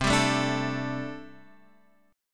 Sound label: D 7th Suspended 4th, C